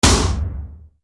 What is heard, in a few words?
METAL SMASHR, Yamaha RY-30